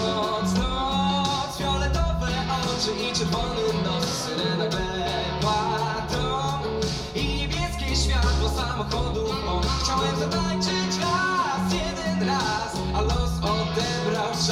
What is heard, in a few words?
rock